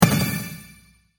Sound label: Magic Power Impact